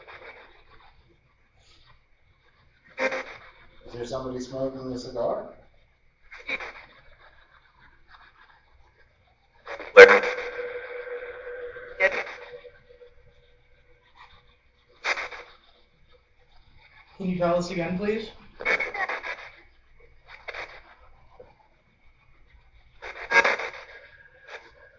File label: Living Room Portal; historic rainworth lodge investigation